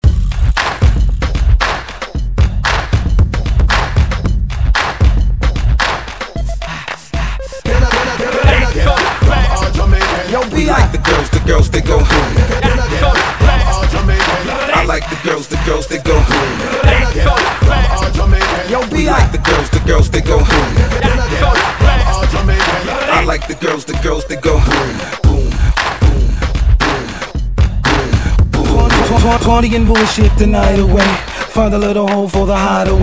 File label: clap; ban clap